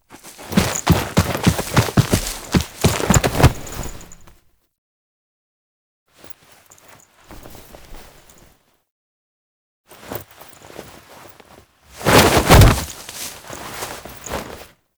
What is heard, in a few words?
Foley; Warframe; The New War; Cinematics; Drifter Lotus Chase Intro